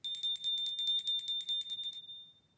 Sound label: tinkle